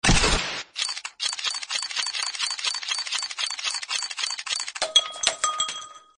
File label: opens